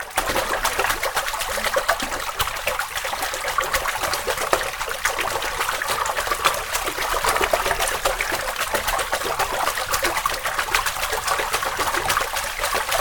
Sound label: kicking water